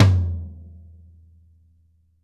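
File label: floor tom; drum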